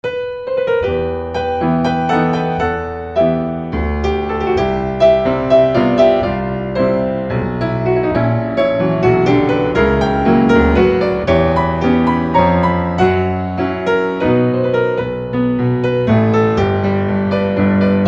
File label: Waltz; Brahms; Op39